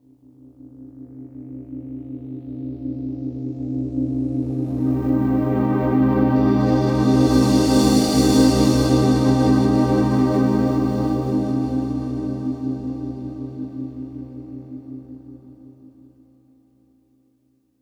VFX Atmos

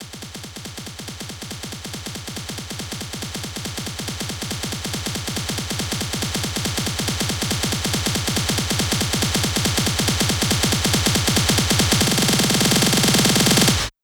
140BPM SNARE 8Bars; Faith; Vengeance Samples; Snares FX Pairs

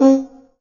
Minimal Perc; Minimal Percussion

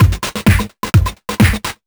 Housebeats 128BPM; Vengeance Samples

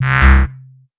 Bass C, Offbeat Bass

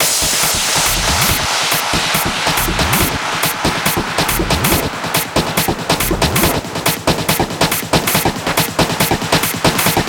140BPM 8Bars, HandsUp & Trance Fills; Vengeance Samples

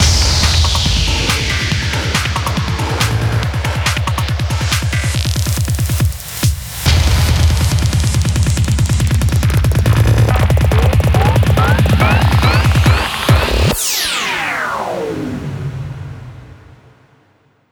140BPM 8Bars, HandsUp & Trance Fills; Compromiser; Vengeance Samples

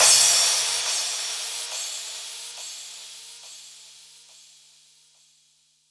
Cymbals Crash; Vengeance Samples

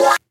Synth Shots; Vengeance Samples

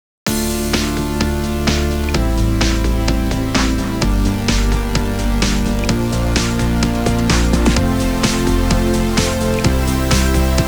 128BPM Dubwise Mix; VFH1 128BPM Dubwise Kit

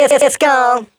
Vocals; Vengeance Samples; Special Sounds

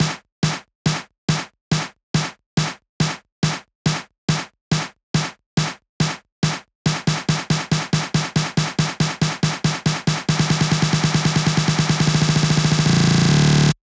140BPM SNARE 8 Bars; Reload; Vengeance Samples; VUF1 Snares&FX Pairs 140BPM

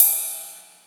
Ride; Vengeance Samples; VEE Cymbals

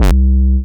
Synth Bass; Vengeance Samples; VEE Synth Shots

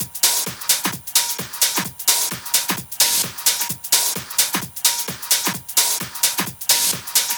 130BPM Kit; Vengeance Samples; VFH1 130BPM Change Kit

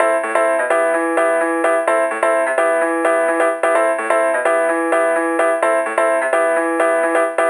128BPM Melody; Vengeance Samples; Doubleride Kit

